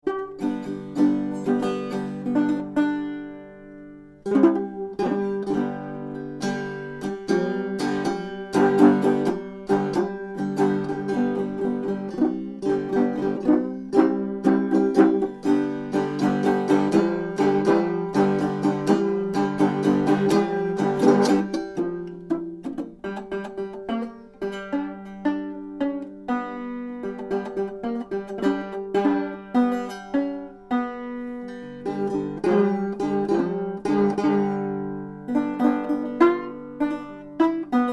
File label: cookie tin banjo, mics i made